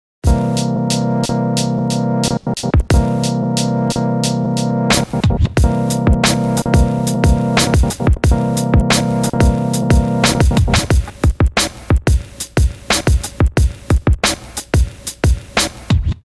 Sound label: drum; requiem for a drum